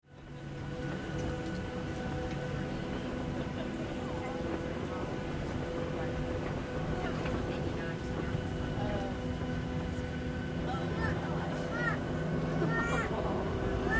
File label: Vehicle Airplane Take Off Interior Commercial Airline; Airplanes